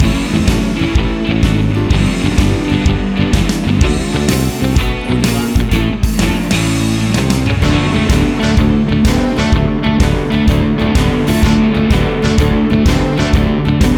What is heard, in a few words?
Blues Boy